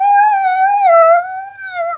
dying; birdo; sm64coopdx; char-select-extra-chars

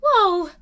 whoa; peach; char-select-extra-chars; sm64coopdx

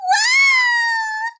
waaaooow; birdo; sm64coopdx; char-select-extra-chars

